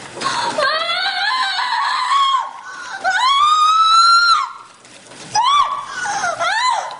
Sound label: lightsaber